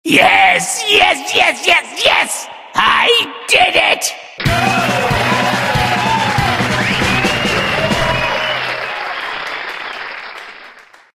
win music; redmond; source engine; tf; halloween mann brothers

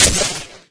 sentry shoot mini, weapons; tf

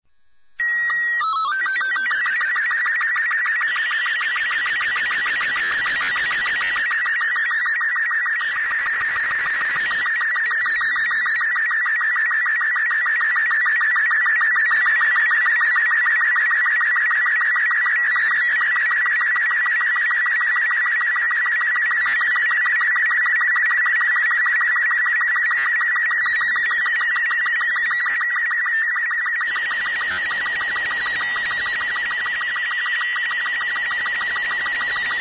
ambient; Portal 2; Source Engine; video games

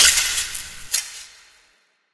spark, coop electric; Portal 2; Source Engine; video games; coop bot

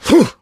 melee swing, player survivor voice; source engine; left 4 dead 2

